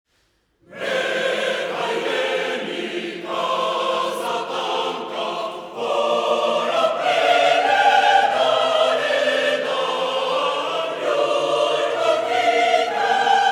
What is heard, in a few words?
STATE ANTHEM OF THE REPUBLIC OF ARMENIA Acapella